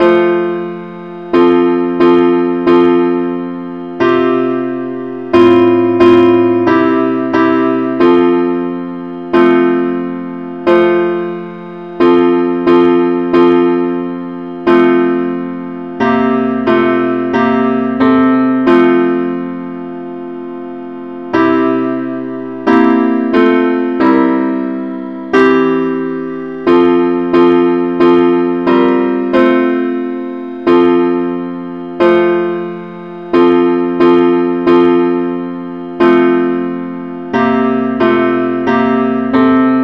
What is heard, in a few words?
parts only key F